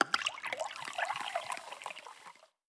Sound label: Cup; blue archive